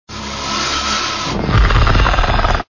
zombie alert

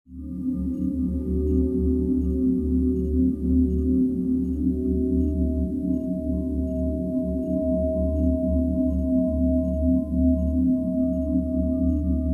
thief store loop

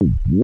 jump; keen

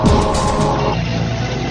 button

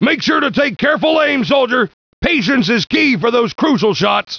rifle

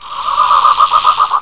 pit worm idle

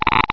geiger

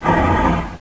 gonome pain

